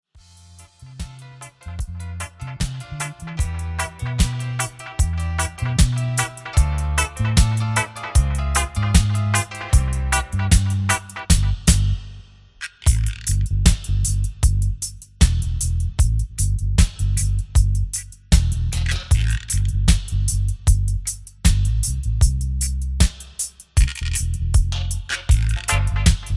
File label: Dub